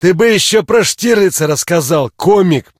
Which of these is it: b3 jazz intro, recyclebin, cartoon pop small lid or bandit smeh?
bandit smeh